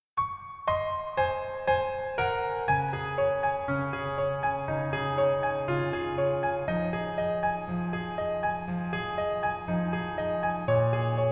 Piano ver, 여자키; 더킹, 영원의 군주

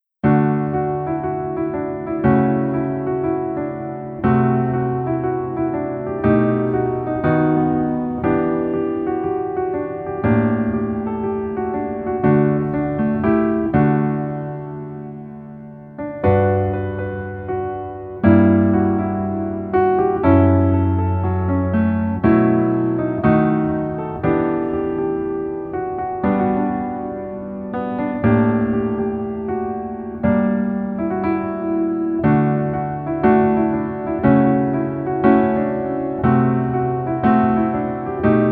Piano ver 멜로디MR -1키

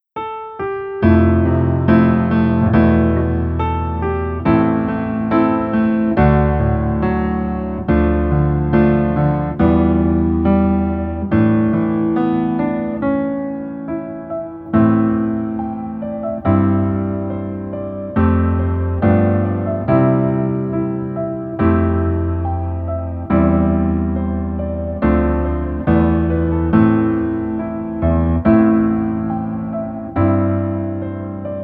Piano ver 멜로디MR

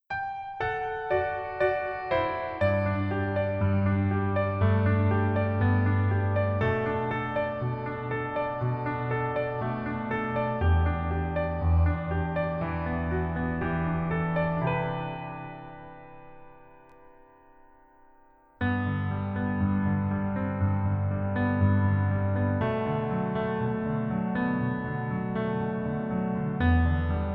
Piano ver, -1키; 더킹, 영원의 군주

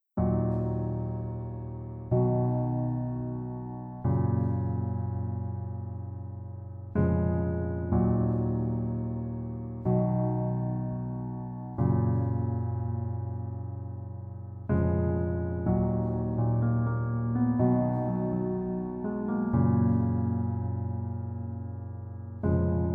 Piano ver 멜로디MR 남자키

